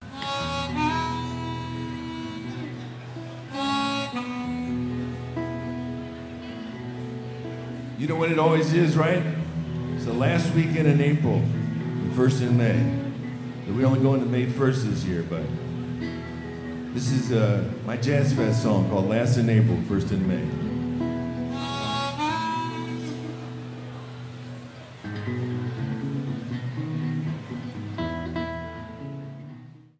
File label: guitar